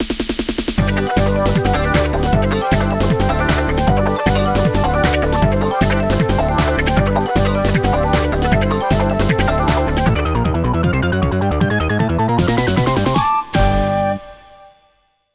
Bonus; Kururin Squash; GameCube

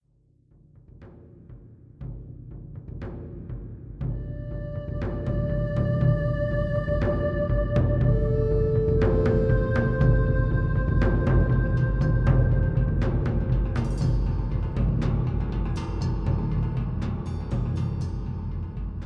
Great Wall Front

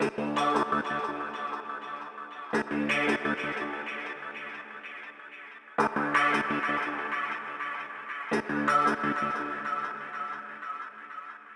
Synth loop; Vostok Inc.

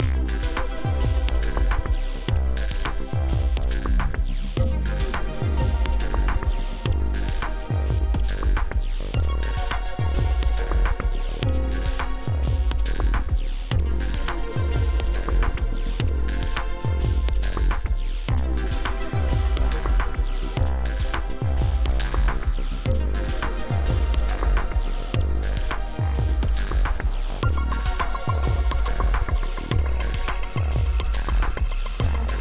Ingamemenu Happy; rive wreck hack die retry; ps4

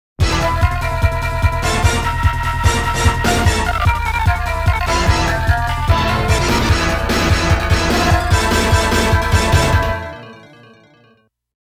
Continue Game Over

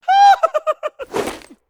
White Cheer; Character White Cheer

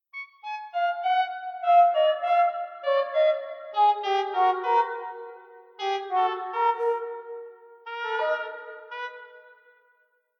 Detune; Toki Tori 2

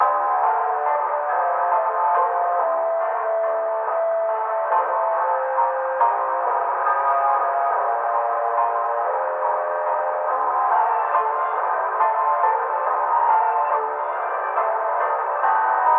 Creepy Forest; don't starve together

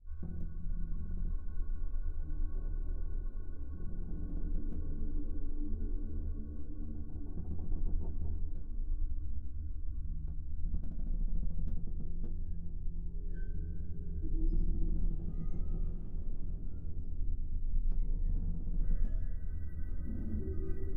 Ambience Ship; Content Warning

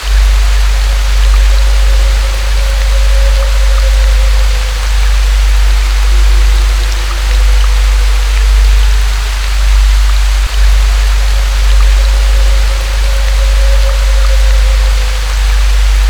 Haunted Rain

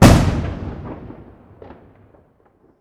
Play Dead Explosion Bang

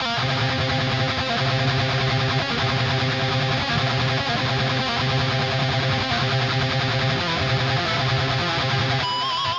Drums and Jingles Evil Lead; Metal Tales Fury of the Guitar Gods

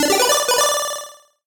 MUSIC EFFECT Solo Chip C64 Positive (stereo); startide macos switch windows gamerip 2017

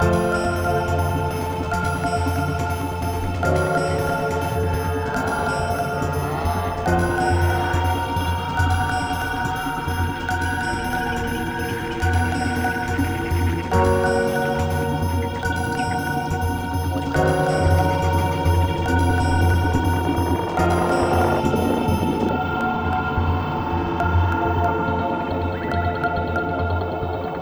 Glitch sonata Slow

